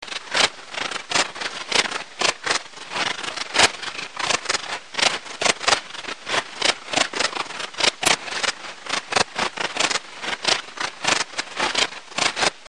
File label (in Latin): pholidoptera griseoaptera; heuschrecken; insekt; wirbellos; biologie